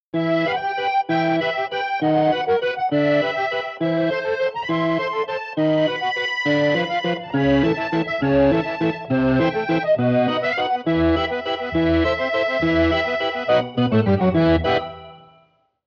The Secret Organ; Bonus; Lies of P: Overture

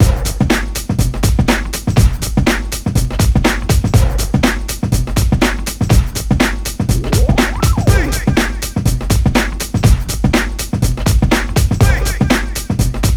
Ditty; NBA Inside Drive 2003; Xbox

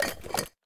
PickUp Ceramic

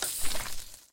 Weed Killer Spray; lethal company

